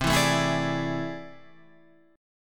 A Minor Major 11th

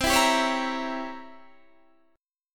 C 7th Flat 9th C down Guitar Standard; C7b9 strummed; JGuitar

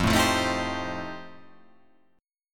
F 9th F, chord

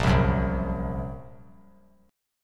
Bb Suspended 2nd Sharp 5th; Bbsus2#5; strummed; JGuitar

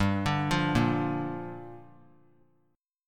G Minor 7th; Gm7 arpeggiated; JGuitar

